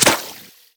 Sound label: water bullet impact; Rust